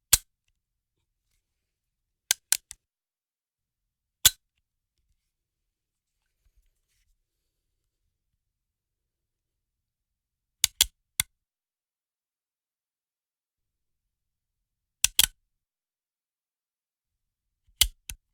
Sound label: 50cal gun cock and dry fire